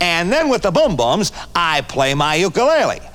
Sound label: VOX GENERAL; Megabass Remix! 2